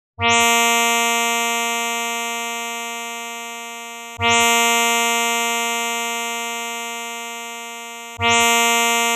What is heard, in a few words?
sa 233 string, tanpura; tuner